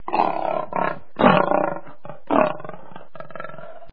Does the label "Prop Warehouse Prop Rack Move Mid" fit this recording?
No